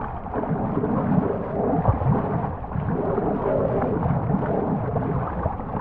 spin Button Add; oceans treasure

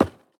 reelBounce; templeofnudges

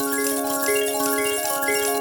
progress loop; silverback

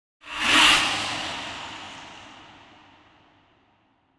character Win; bloodsuckers2

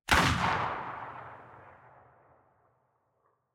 ambience gun; dead or alive 2

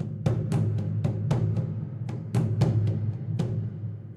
intro Loop; jungle spirit

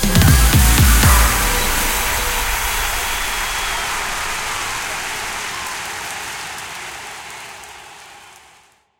bonus Win; football

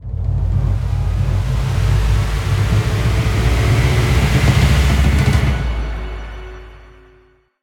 aloha near win

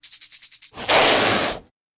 win Snake; jungle spirit

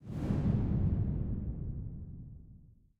spin start; elements

